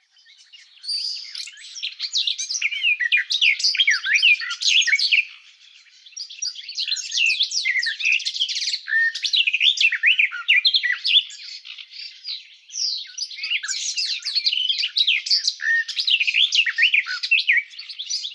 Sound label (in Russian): slavka sadovaya i shegol, zvuki prirodi